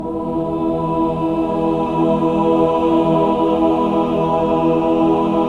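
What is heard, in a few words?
major chord, Symphony of Voices; Spectrasonics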